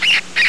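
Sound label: squeak, rat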